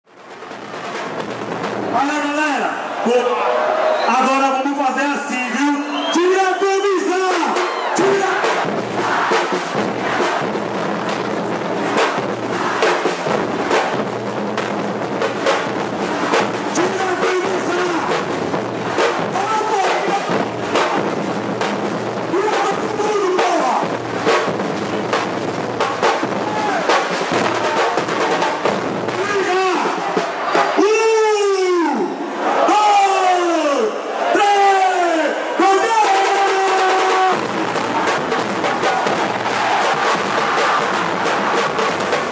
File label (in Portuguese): bateria